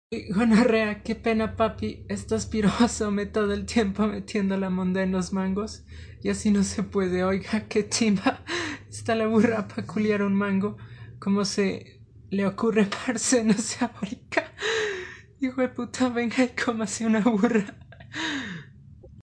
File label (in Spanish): conga colombiana